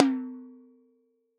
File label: Tom 8 Inch, Drums; One Shots